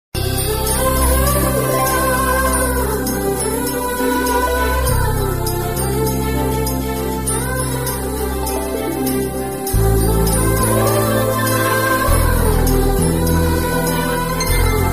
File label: bgm ringtone, telugu movie bgm; premikula roju